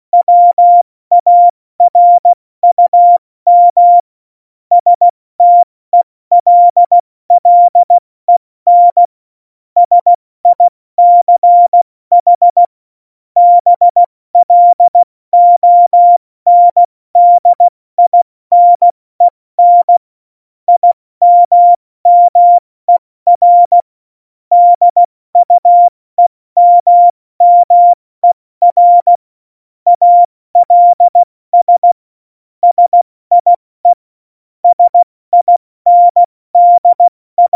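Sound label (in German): Witz, Tempo 70, Morsekurs, cw morsen; Witze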